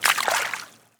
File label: warrior run water